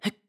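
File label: Climb, voice; BOTW; Breath of the Wild; Link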